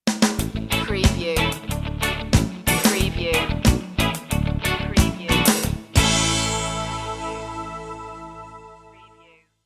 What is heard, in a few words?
reggae stinger